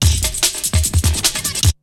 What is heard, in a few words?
BREAKBEATS, TECHNO